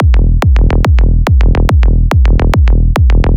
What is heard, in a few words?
Kick Bass Bassline Triole Triple Loop E1 142bpm Psytrance